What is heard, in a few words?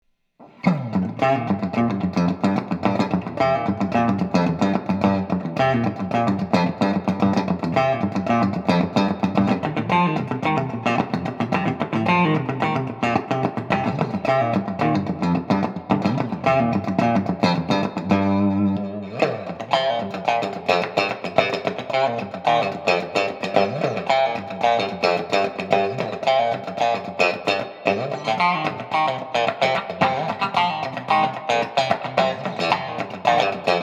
Greeny Burst Super Reverb Slappy Low; Greeny Burst Fender Super Reverb 4; Vintage-Style Humbuckers; Straight In - NO WAH Pedal